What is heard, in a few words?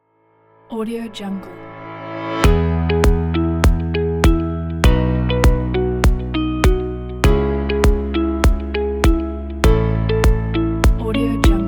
calming corporate uplifting